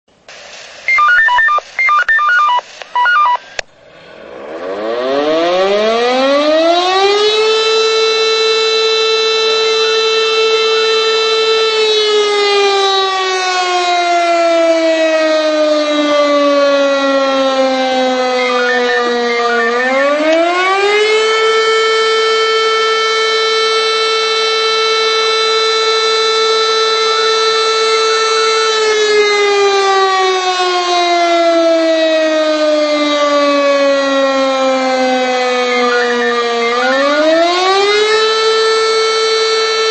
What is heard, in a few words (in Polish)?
syrena wybieranie selektywne